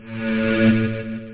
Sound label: ALARM